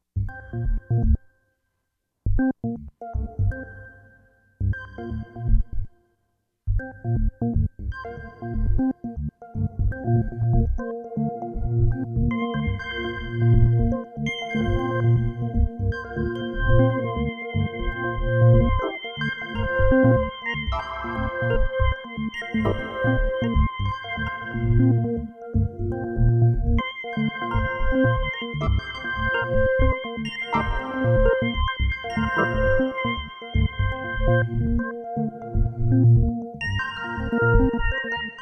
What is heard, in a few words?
organ; Organ Geniale